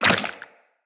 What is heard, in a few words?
Step Goo, Footsteps, Walk; Tenno